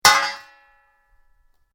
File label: METAL OBJECT CRASH; Metal Sound Effects; Metal (Sound Effects:Materials)